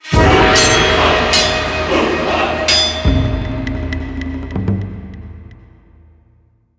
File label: Jingle, encounter